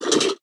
recycler vox; Factorio

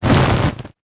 space platform explosion; Factorio

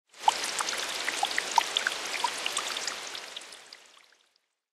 rain on water; factorio

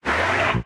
arm extend, asteroid collector; Factorio

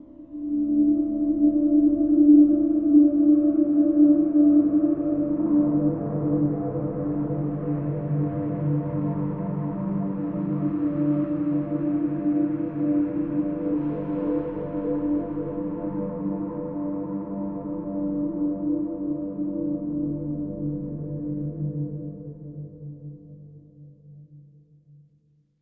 space, ambient; Factorio - Space Age

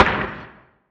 asteroid damage oxide small; Factorio

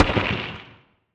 asteroid damage carbonic small; Factorio

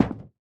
mech armor land concrete; Factorio

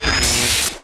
lightning attractor charge; Factorio

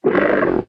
stomper idle, enemies; Factorio

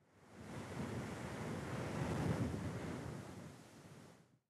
wind gust; factorio

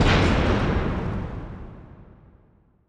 asteroid damage metallic huge; Factorio

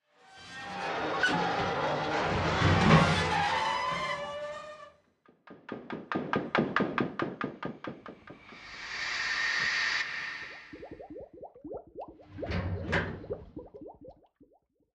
recycler loop; Factorio

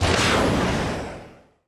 rocket turret launcher; Factorio

